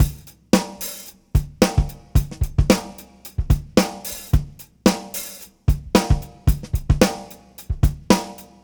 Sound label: Beat Loops, 111; Zero-G - Monster Beats